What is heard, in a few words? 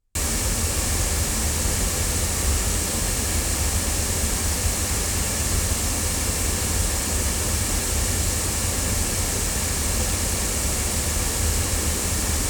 sE 2A omni loudspeakers; se electronics